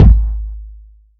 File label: puffy kick drum one shot g sharp key